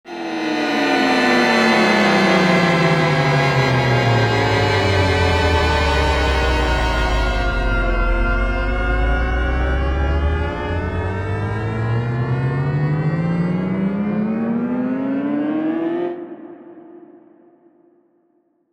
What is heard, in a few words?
viola glide; midi; 50 notes; dan dean